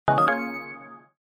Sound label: Confirmation, System sounds; U370 Disney Mobile; LG